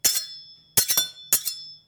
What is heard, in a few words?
Long Knife Blade